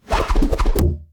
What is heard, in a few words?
Knife, Throw Knife Into Wood; gun and weapon sounds; captain audio; fully loaded